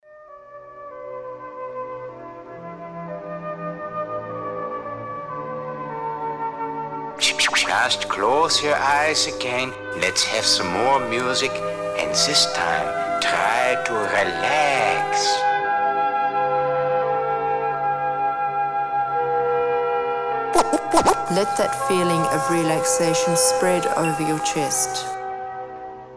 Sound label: Beats of Burnen'; Burntablism Volume 1